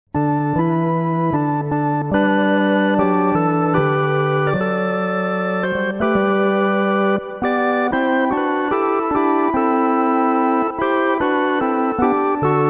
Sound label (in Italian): solo musica